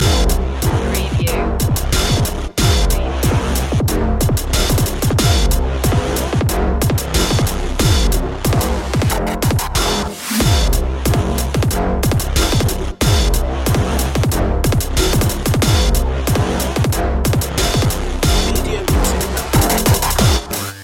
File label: dubstep of frying cpu loop